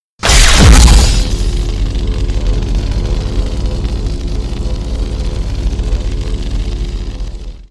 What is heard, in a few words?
lightsaber sound effect; kylo ren